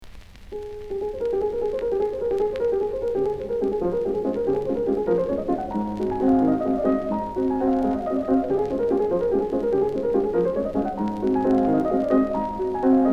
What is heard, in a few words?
waltz Des dur; Chopin; Valse Nr. 6 Des-dur op. 64-1; Stefan Askenase; fortepiano solo; shellac 12" Deutche Grammophon; 78rpm shellac моно ремастеринг